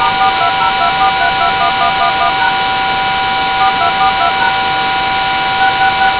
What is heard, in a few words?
dtmf3